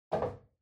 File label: TV Hardware Far Put